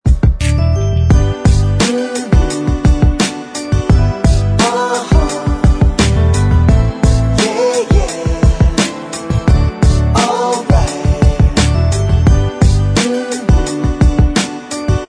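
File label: backing vocals track, backing tracks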